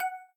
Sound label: count Up Loop End; netent; luckyangler